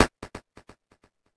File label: pickup